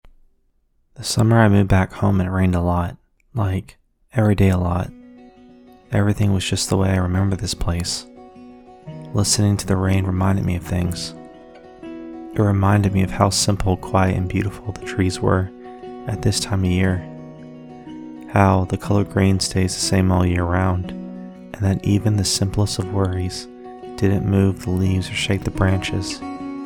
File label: Instrumental Cover